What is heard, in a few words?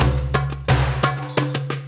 spingle Drum Loop; netent